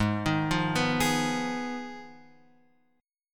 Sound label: G sharp Minor 6th G sharp down Guitar Open F; G#m6 arpeggiated; JGuitar